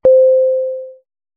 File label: Mention Sound